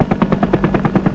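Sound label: HELICOPTER; CMI Sound Library Rev 1.3; MACHINES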